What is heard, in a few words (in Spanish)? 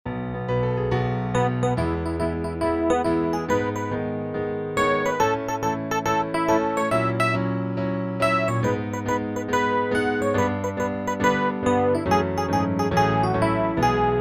REFE PIANO; tono 4; audición; piano solo; convocatoria a audiciones el mago de oz